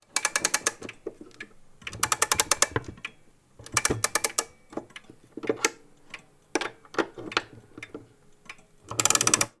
household clock grandmother wind up

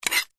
food cereal bowl single spoon scoop

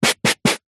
household brush small brush carpet

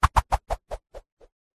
cartoon boomerang throw spin whooshes

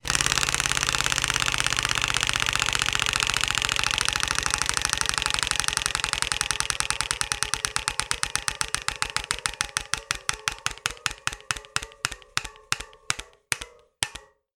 wheel of fortune style wheel spin; zapsplat

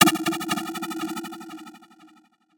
sound design effect hit clunk flutter; sound effects one

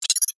science fiction robotic insect critter chirp; zapsplat

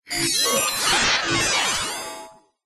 science fiction machine or robot glitch

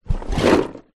household fabric drawer open storage tidy

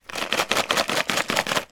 food candy lozenges packet shake; fishermans friends

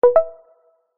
notification tone soft subtle

